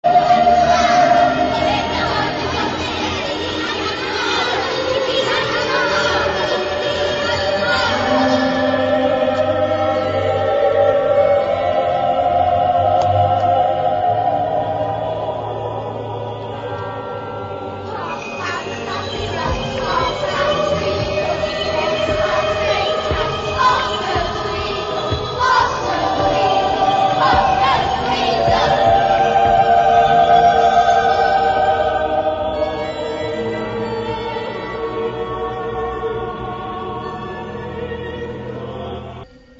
Celtic Requiem; Tavener; This complex early work is based on religious and fertility customs from pre-Christian to poems and rites of early

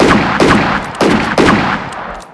weapon

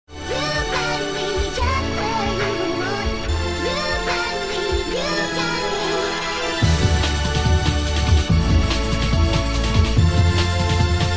kick harmonizer

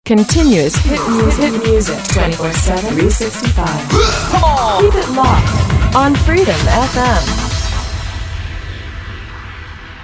continuous hit music

